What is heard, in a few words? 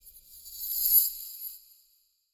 Reverse Magic Shimmer; SoundsCrate